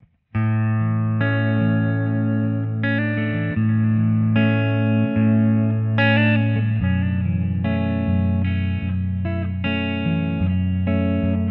warm clean twin; podxt

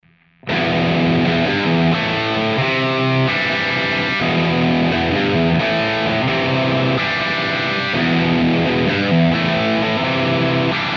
JCM800 4x12 v30; podxt

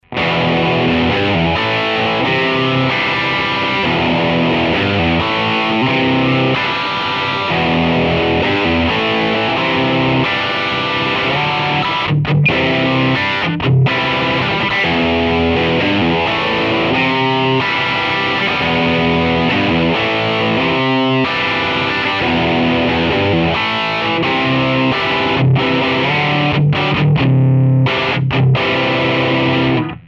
JCM800 1x12; podxt

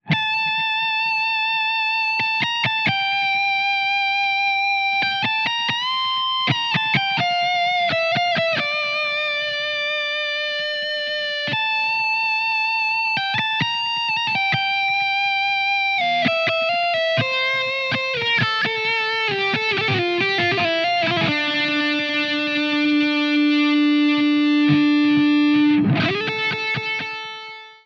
insane lead snippet, podxt

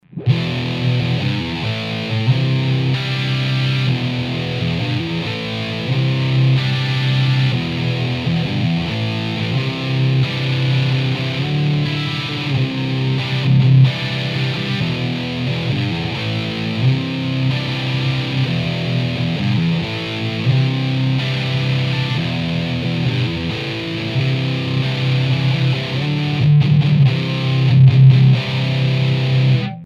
4x12 g25; podxt

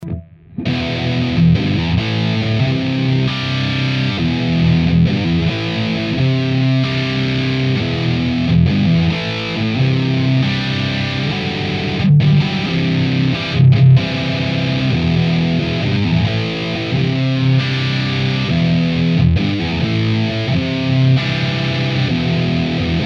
4x12 v30; podxt

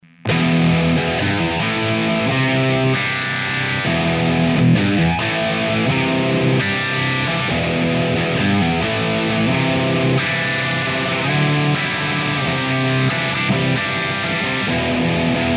JCM800 4x12 v30; podxt

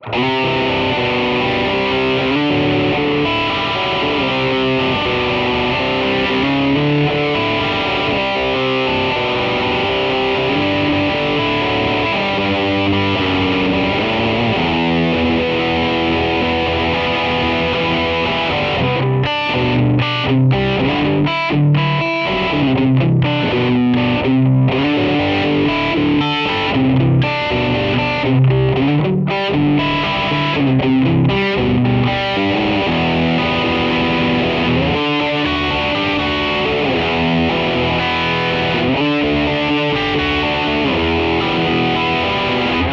charger big fat, podxt

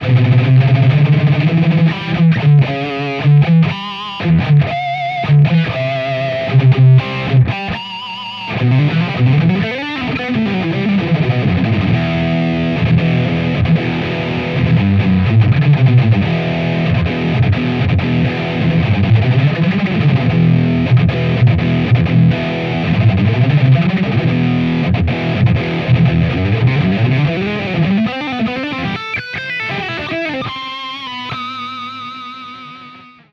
soldano no fx; podxt

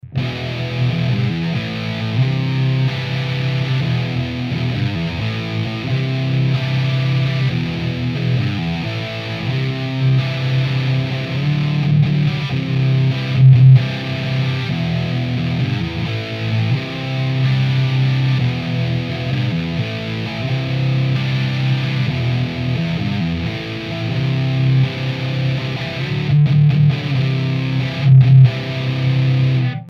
4x12 v30; podxt

